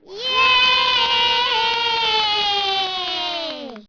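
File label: kids yay; Microsoft Graphics Studio; Home Publishing 99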